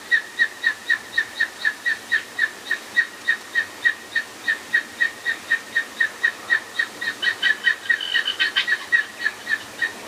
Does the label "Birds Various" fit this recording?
Yes